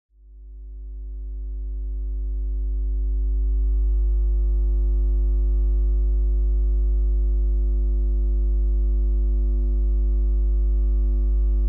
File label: old electric plant trasformator 110000v